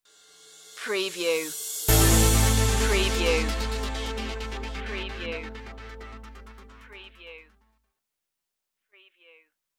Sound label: disco stinger